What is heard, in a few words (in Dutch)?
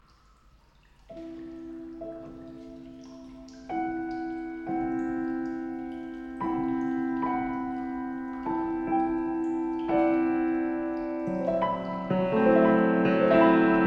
piano improvisatie